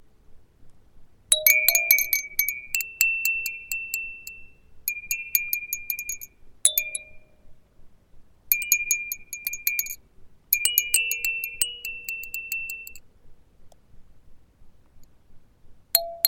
chime

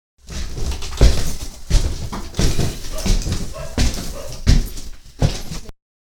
short up the stairs with quiet dog bark

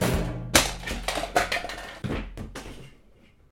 clonk

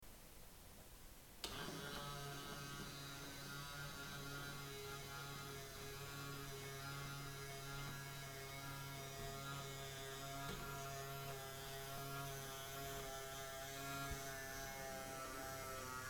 electric roof window closing

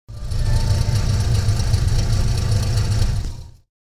supermarket compressor motor

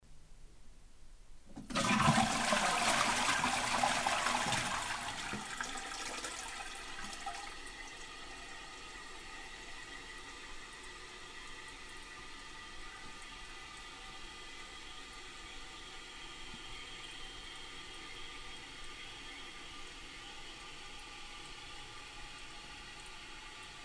toilet flush

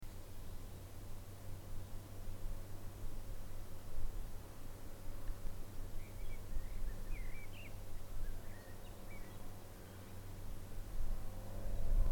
propeller plane and birds singing

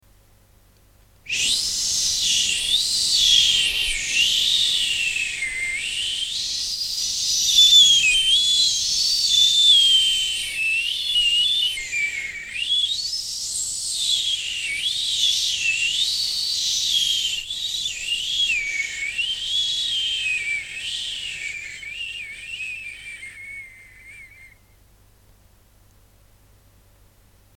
shhhw shhhhw

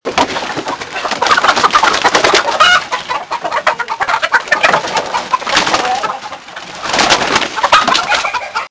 hens leaving coop

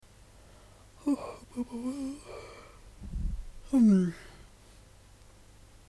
yawning

